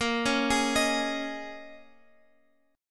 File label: Bb Minor 11th, Guitar, Open F; arpeggiated; x,x,10,8,11,10